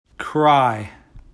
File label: Cry